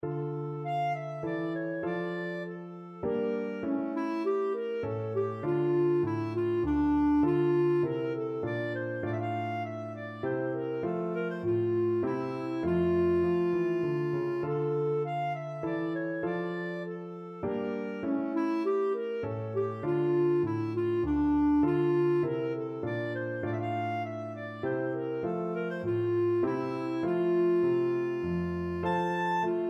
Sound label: minuet in d minor clarinet; bach bwv anh 132